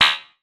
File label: error